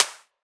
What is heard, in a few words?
Clap, Psytrance